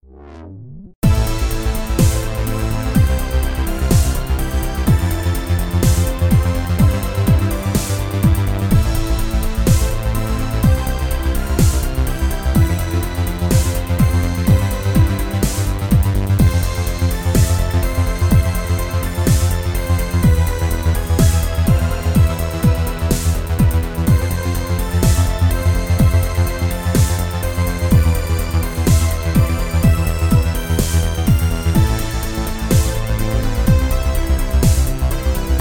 techno ending; Cally's Trials

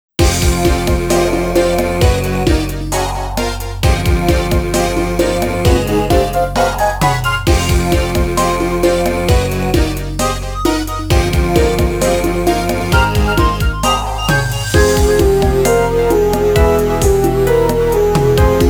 Unsettling Atmosphere; Rockman Sound Box

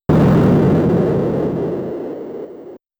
Miss; galaga

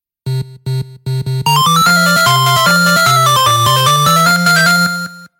8bit; invercity

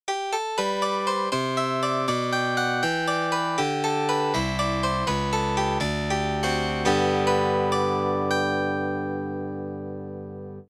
Piano Harp; Piano app by Yokee

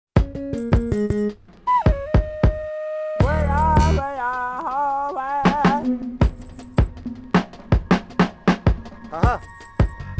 Native Funk; Kid Pix 3 Deluxe; Windows

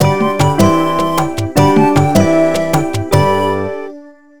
Sting Award Found; simanimals africa 2009 nds gamerip

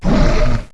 attack devastator

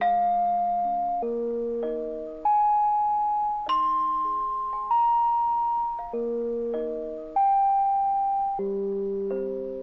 Path Glock; Proteus

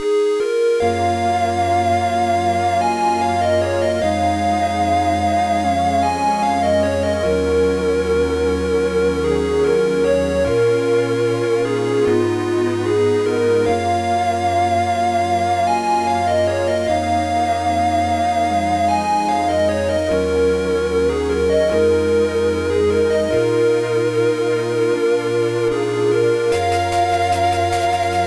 BGM; Croket 3 Granyuu Oukoku no Nazo; gba

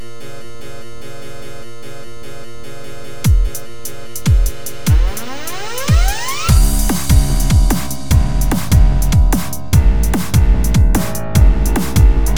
Instrumental; Friday Night Funkin' Dave's Late Halloween OST mod Windows gamerip 2022

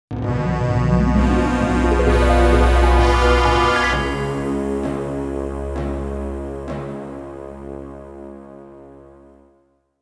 Battle Entry Jingle; Genso Suikoden IV Original Soundtrack

